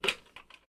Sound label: phone pickup; crimecity